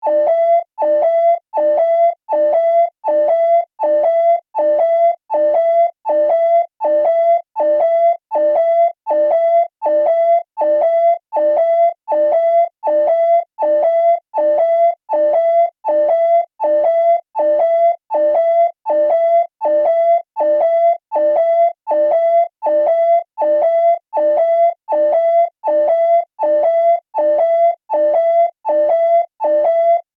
Alarm Siren, Scifi, Alarm, Siren, Science, Fiction; Tech; BlastWave